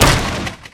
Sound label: wood big hard